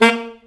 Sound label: SAX A. STAB, Alto Section; Roland LCDP07 Super Sax; Alto Sect FX